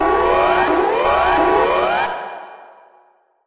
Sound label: Nuke Warning